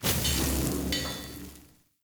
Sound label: Kintsugi Pickup